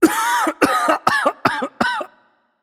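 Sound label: nonverbal clear throat; voicepack; smite; merlin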